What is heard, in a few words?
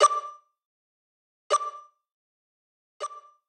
Health Low Warning